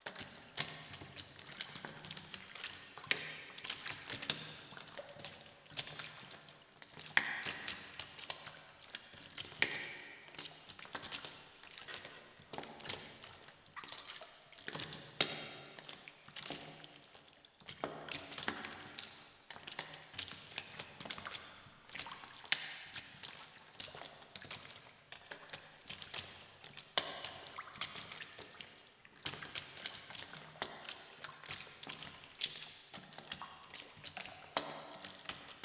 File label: Footsteps Sewer; HauntedBloodlines